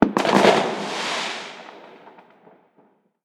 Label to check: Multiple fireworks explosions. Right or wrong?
Right